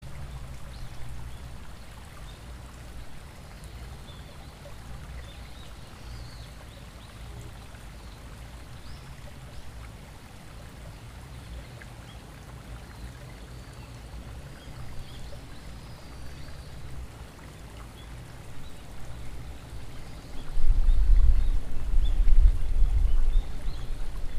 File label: seseragi bird